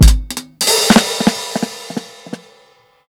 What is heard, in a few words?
120 FILL, DRUMS LOOPS; BASEMENT DRUMS VOL 2